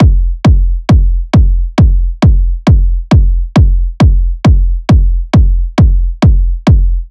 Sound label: Kick Loop 135, Techno Drums